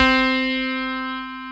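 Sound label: YAMAHA CP70 C2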